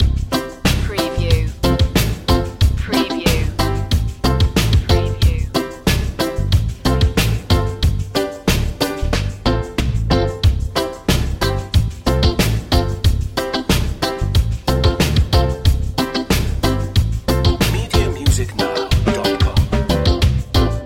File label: reggae simmons loop